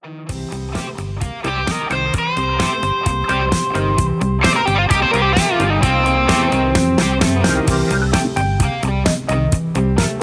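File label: Instrumental Leads with background vocals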